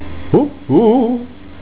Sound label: hoho